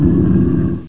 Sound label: dragon; mordor